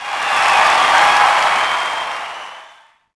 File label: POS Reaction,Crowd; Cars; XBOX